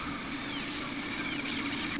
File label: silence